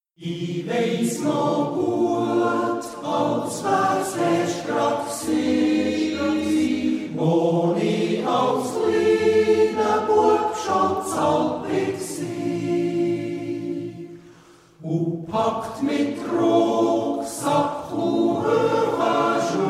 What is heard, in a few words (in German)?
Chor